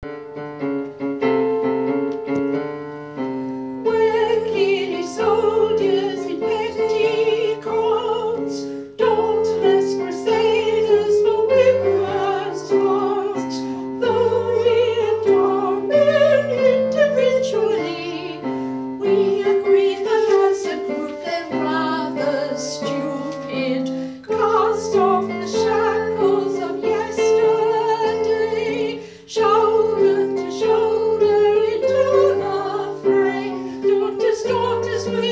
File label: Music and Singers